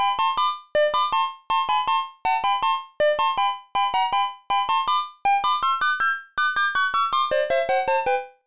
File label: Jingle BGM CLASSIC FROG DOCK; frogger helmet chaos nintendo ds